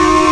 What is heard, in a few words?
ball homing; Garry's Mod; tf2; passtime